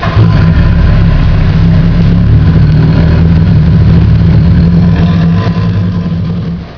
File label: roar